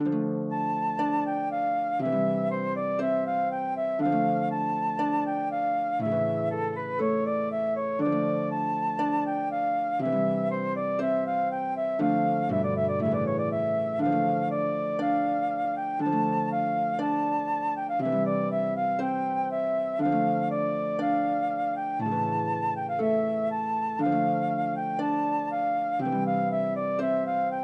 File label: flute harp; ortiz diego; recercada primera